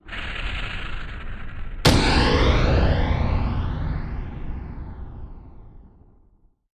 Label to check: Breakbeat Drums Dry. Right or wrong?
Wrong